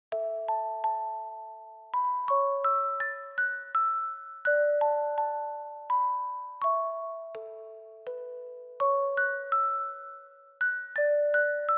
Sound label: Reverb Slow; Akatonbo